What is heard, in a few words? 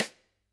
Sd St, drum